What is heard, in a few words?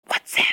whatsapp